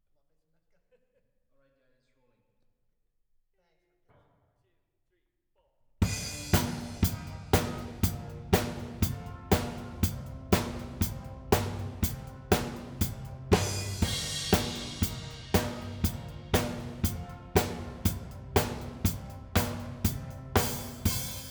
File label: Kit L